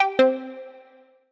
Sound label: sfx Button back